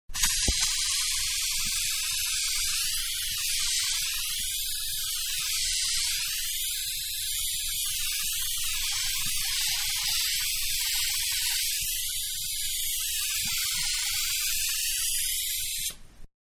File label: Can Spraying Liquid, Can Aerosol; Spraying (Sound Effects:Objects); Manhattan Production Music